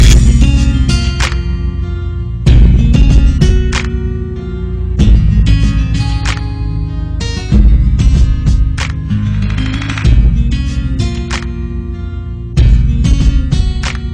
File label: guitar